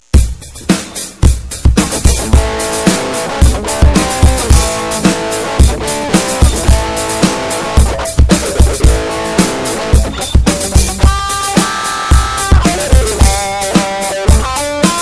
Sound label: art rock explozion